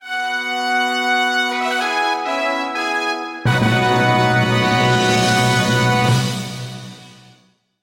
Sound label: channel menu; mario sonic olympics